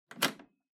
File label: Public Phone Coinbox Lock